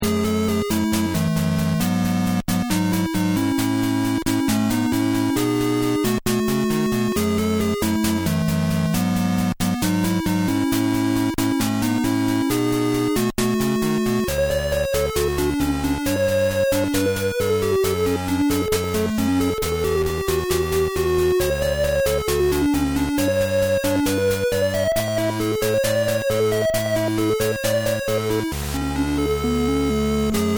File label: shop game over; ironsword